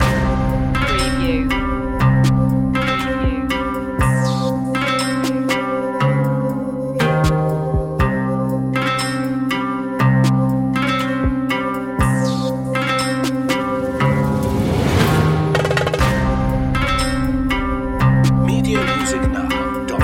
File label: eastern mystic loop